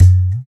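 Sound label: TALKING DRUM; East West - Phat & Phunky CD2; 90's Sample CDs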